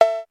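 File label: cowbell, single, low, dull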